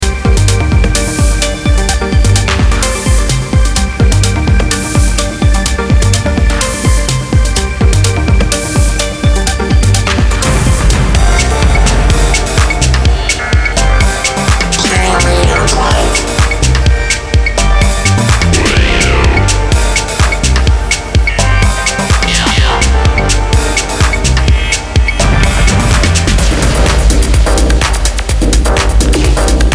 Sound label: EDM